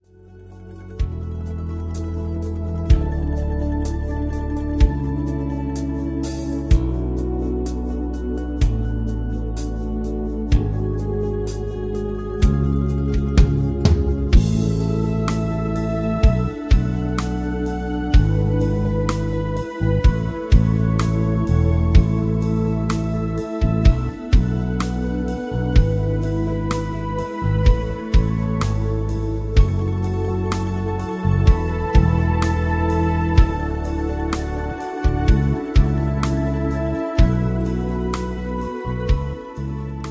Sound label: rhythm